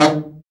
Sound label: BRA BARI, Bariton Sax